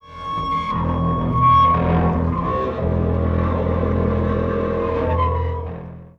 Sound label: E, Guitars; Total Drum&Bass; 90 s Sample CDs